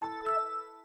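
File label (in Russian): звук Windows 7 вход пользователя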